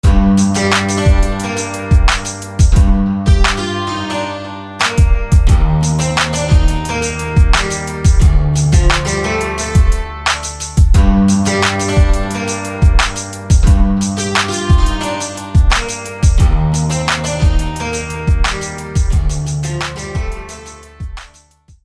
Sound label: Oakwood Guitar; SFB FX